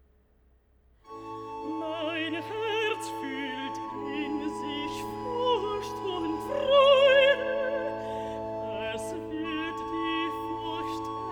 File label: Recitativo (Alt), BACH for ALTO; Schmьcke dich o liebe Seele; Mein Herz fuhlt in sich Furcht und Freude